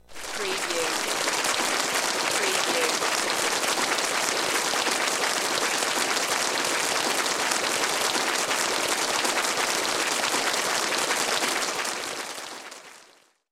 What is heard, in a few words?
Applause